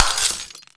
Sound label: ball buster break, weapons; tf2